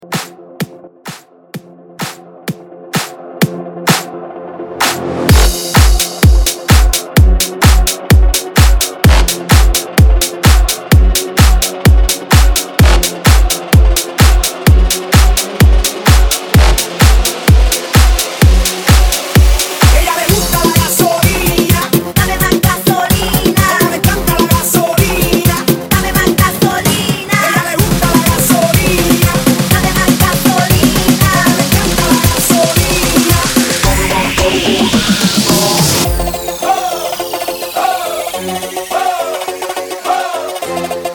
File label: Transition Bootleg 128 105bpm